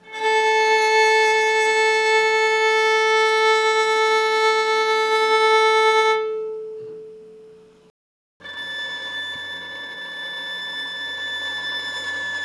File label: violin harmonic